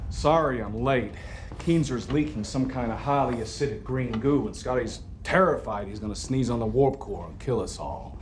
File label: sneeze on the warp core; Star Trek; Beyond